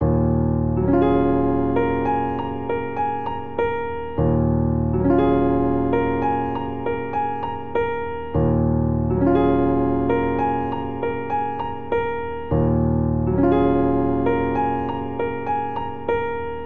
Ostinato Free Cinematic Melodic Eb Minor 72 bpm, Grand Piano; Eb Minor 11; MIDI Clips; Ableton